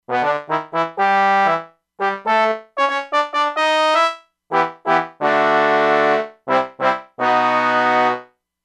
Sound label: Trombones, E-mu Proteus-2; Factory Presets